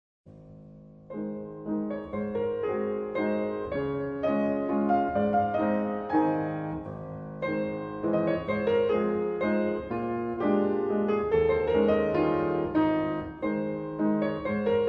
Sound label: Mazurka